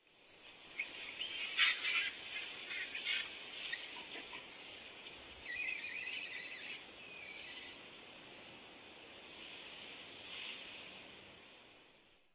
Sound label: saru, singing